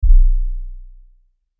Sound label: Lfe Hit Ultra Low; Production Element